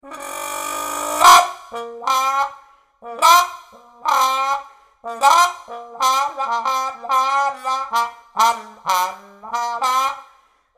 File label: Trombone